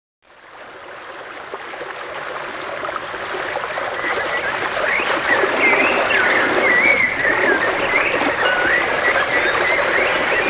river; PUPPIES

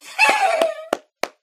best shot; Geschicklichkeit; AquaBubble2

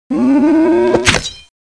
AV ATKM; Renaissance

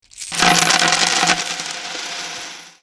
raise cash

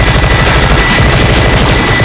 Mini Gun; Urban

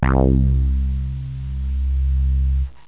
bass, anabass; instmnts

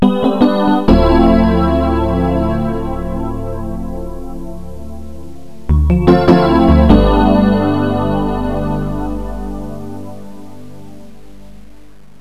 pad piano; Terra Sound Library; construction set

